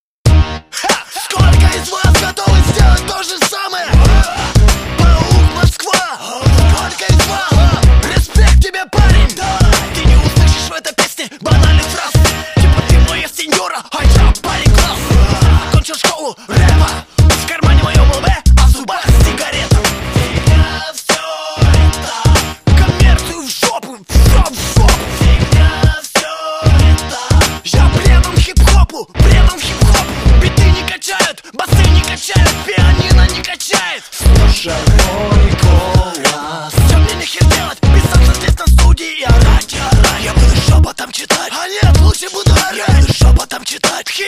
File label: eye catcher hip hop